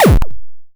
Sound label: cannon, weapons